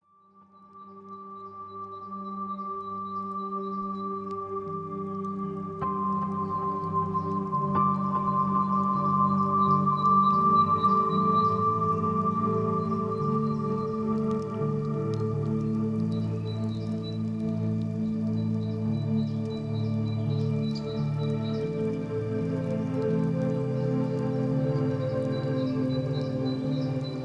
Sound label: sounds of nature birds song; sounds of nature kingdom; reduce stress